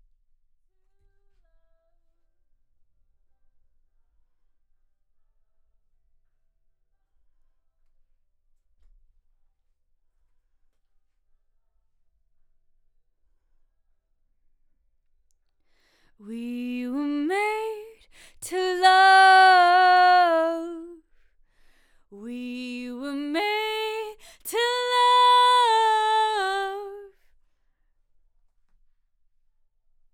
Vox new

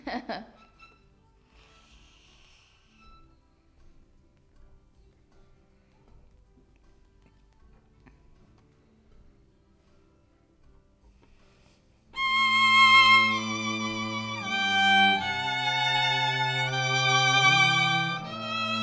Violin

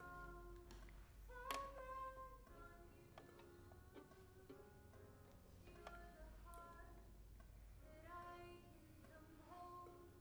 violin

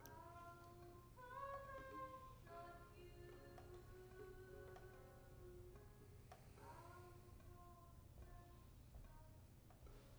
violin

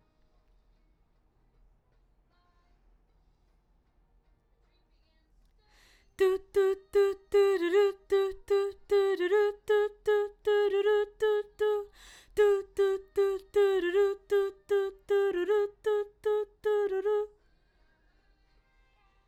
BG Vox

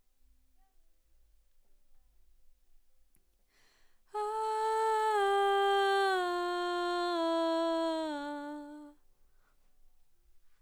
BG Vox

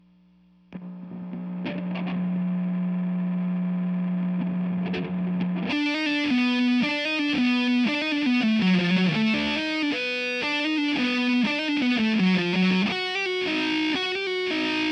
GTR

